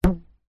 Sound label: hair band pluck twang; foley; zapsplat